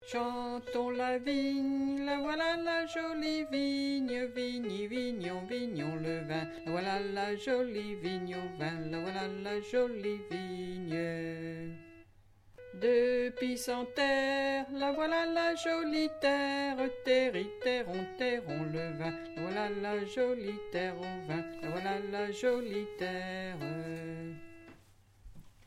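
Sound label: Soprano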